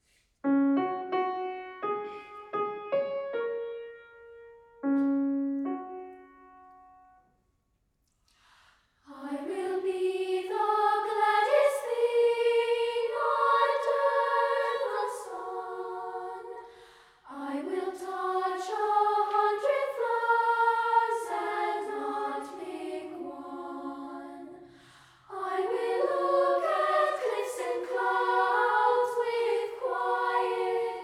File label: treble; hill country ms